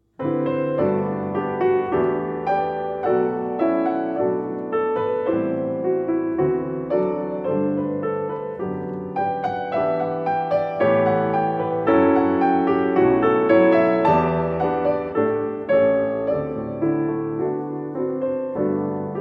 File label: Bourrée, Cello Suite in C Major; Cello Suite No. 3; BWV 1009